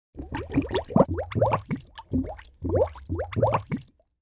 Cartoon bubbles; bubble sound effects

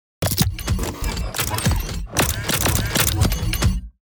Steampunk engine mechanical process various gears

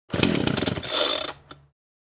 Chainsaw starting failed attempt

